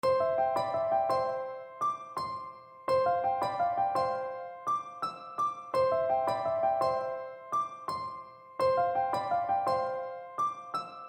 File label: singing